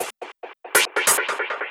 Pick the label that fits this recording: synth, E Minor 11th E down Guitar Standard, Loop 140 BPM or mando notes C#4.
Loop 140 BPM